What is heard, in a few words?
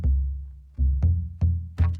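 Calm Far, music; from dust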